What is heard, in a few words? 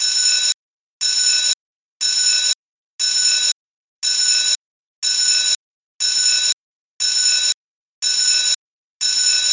lockdown alert